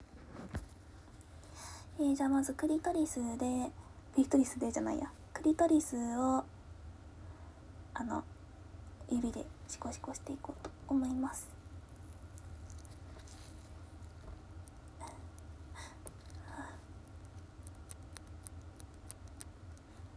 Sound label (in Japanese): オナニー音 指; 「イグぅ!?びっくりした～急にイっちゃった。」現役JD; 秘密のオナニー